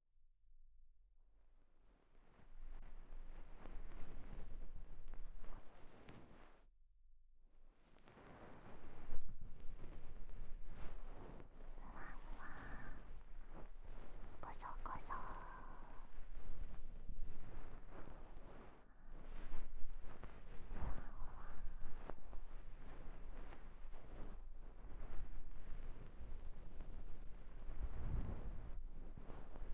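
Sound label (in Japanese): 梵天耳かき left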